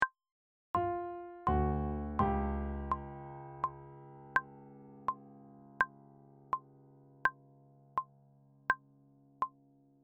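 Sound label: cuban 80 tempo flute and bass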